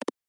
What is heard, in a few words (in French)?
bouton